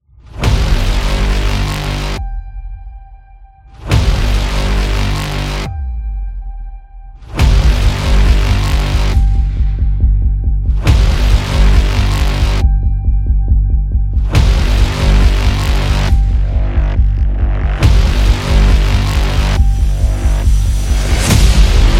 Teaser Epic

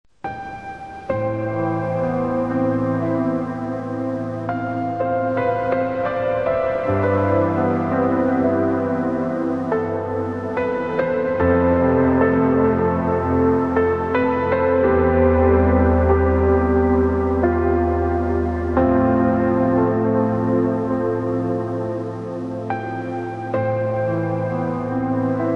Sad Piano

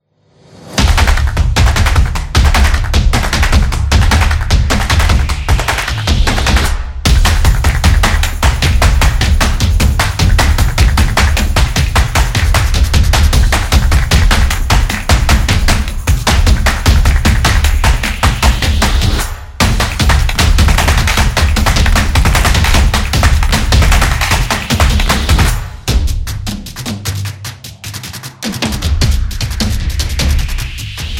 Power Up

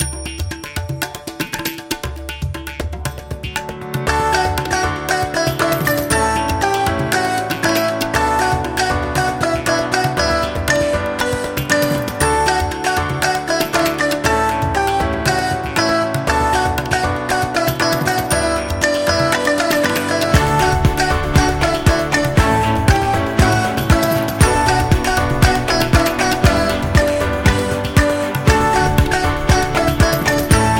Indian Traditional

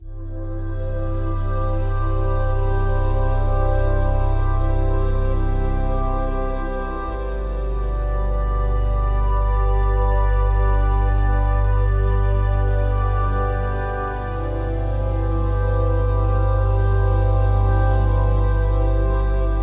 Soundscape

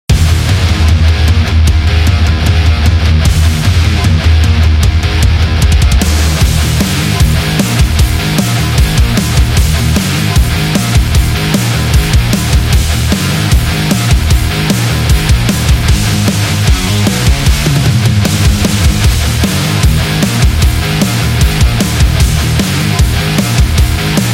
Metal Heavy

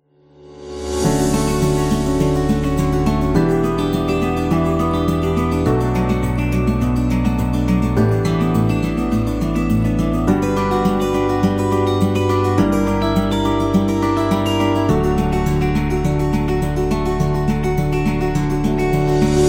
Friendly Acoustic; medical background

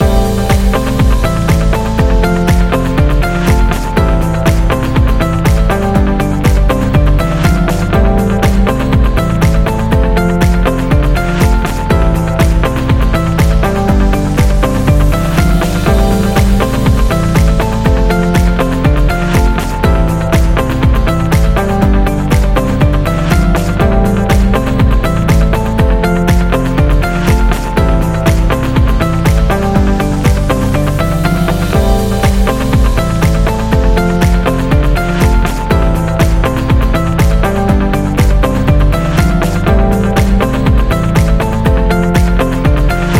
Interview Loop; explainer

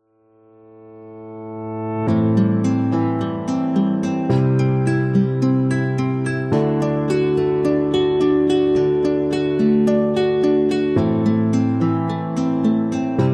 Acoustic Guitar Inspiring

